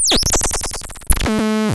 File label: Mechanical Squirrel, Cwejman S1